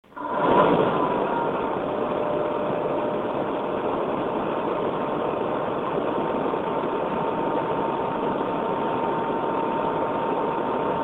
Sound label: cm jet 4 low